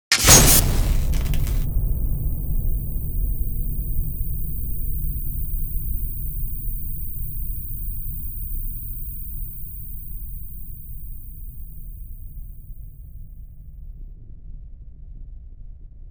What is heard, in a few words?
TV Explosion Ear Whistle; Silent Hill Downpour